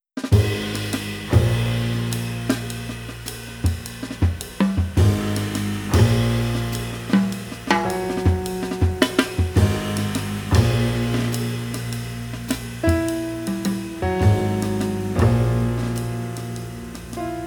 blues, quartet

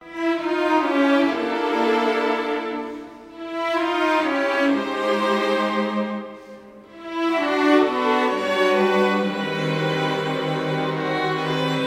sketches for bass orchestra

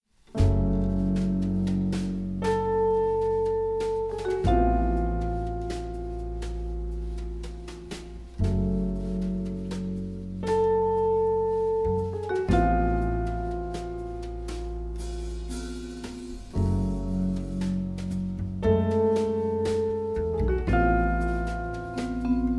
quartet

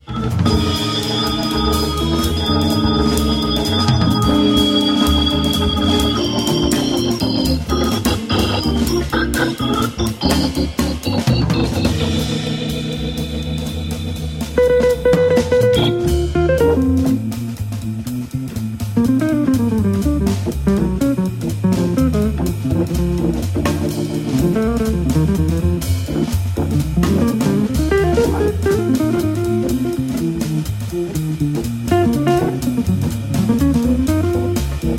trio, organ

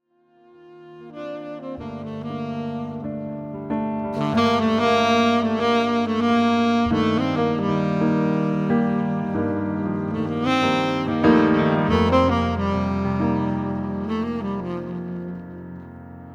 trio

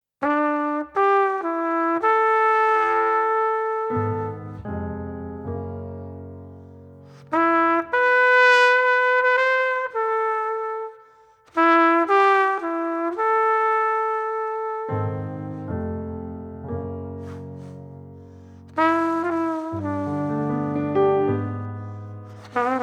quartet

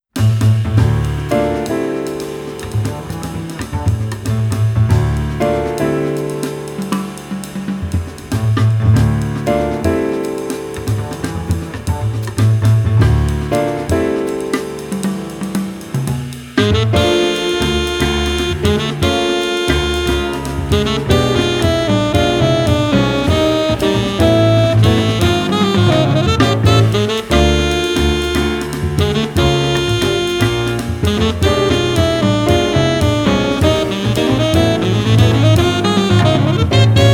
quartet

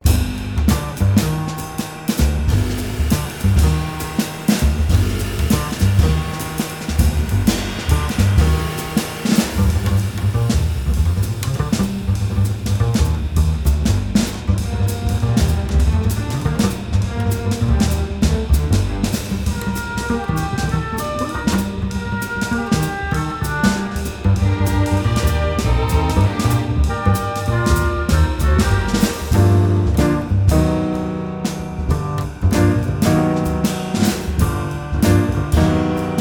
sketches for bass orchestra

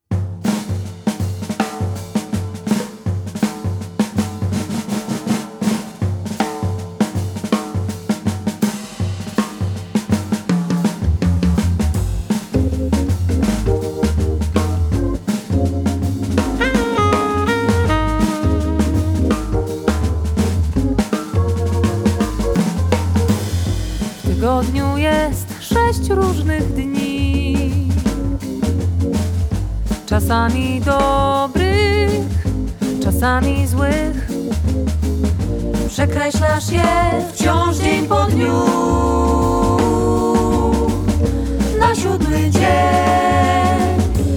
vocal group feat organ trio

